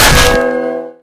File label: melee guitar; weapons; Left 4 Dead 2